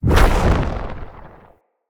Laser Player Impact; Enemy; Characters; Abilities; Mendicant; Mnd King

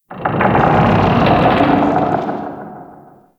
Settlement Low Rock Creak; Warframe; Grineer Settlement

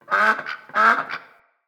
Trapped Duck Call; Encounters; Animal Rescue

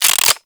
Revolver Pistol Reload Chamber Fast

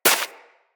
Bullet Impact Debris Snow; Weapons

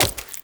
Wall Jump Flesh; Footsteps; WallRun; Warframe; Characters; Tenno

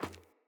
Sandals Run Metal, Footsteps

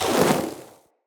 Elemental Fire Arrow Shot, Bows; Weapons

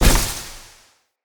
Casting Device Block Impact; Weapons; Player

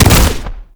Arcade Automata Impact; Warframe; Nineteeen Ninety Nine; Deep Archimedia

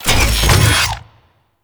Sword Sheath; Vehicles; Abilities; Thano Mech; Warframe; Nechro Mech

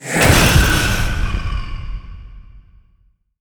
Ghost Teleport Shockwave; Warframe; PriestQuest; RellGhost

